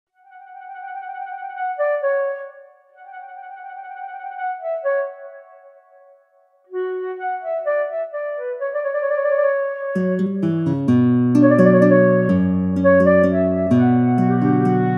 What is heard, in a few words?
ORCHESTRAL